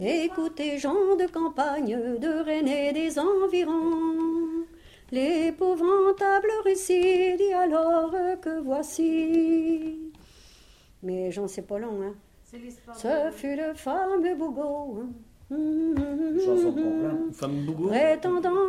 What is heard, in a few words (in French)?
Complainte